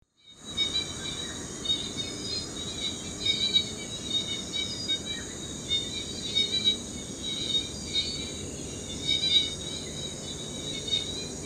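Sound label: occidozyga martensii, Marten's Oriental Frog call; Air Hitam Dalam, Penang State, Peninsula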